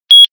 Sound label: strident beep